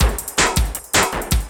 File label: LOOP L, Drum & Bass 160 BPM